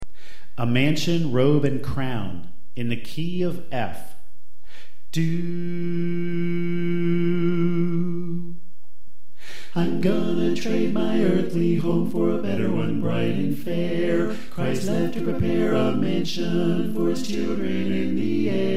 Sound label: Soprano Left